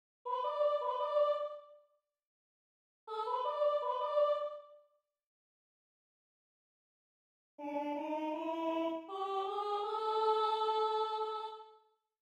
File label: all sopranos